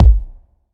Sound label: low tone kick sample G sharp key, bass drum sound tuned to the G# note; kick drum samples; one shots